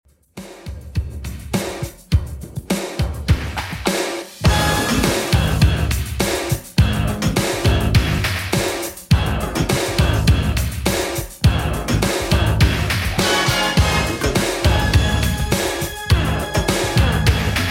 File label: 90s Rnb Redrum 103bpm